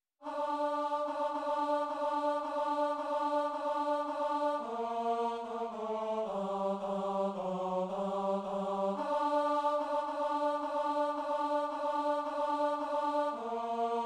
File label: tenor; now I see